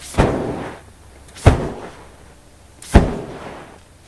Fireballs; gcompris; memory activity

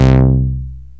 matrix1000 pluck, basses; lmms

